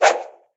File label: Missed Steal Swoosh